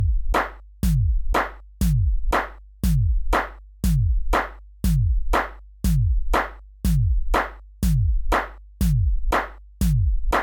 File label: superdirt supercollider together